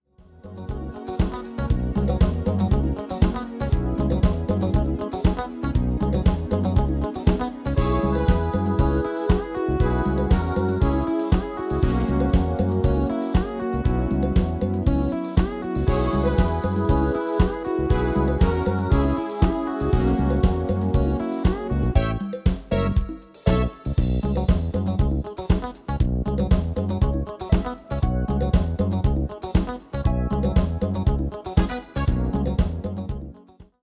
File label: MIDI